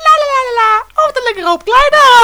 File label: lalala